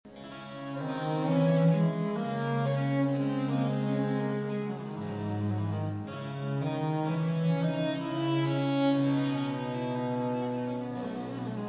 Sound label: folia; marais